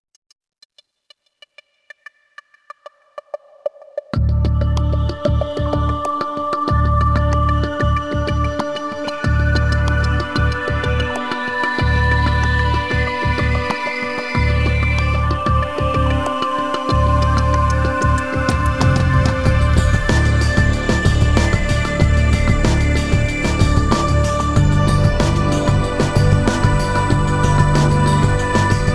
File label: generic victory music; mechwarrior4